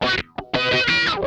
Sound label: RASP, Funk Guitar; 90 s Sample CDs; Zero G